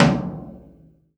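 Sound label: ambient floor tom, Acoustic kit; Akai MPC2500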